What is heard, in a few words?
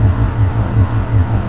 Sci-fi Electricity Hum Loop; SFX Kit; Sci-Fi Loops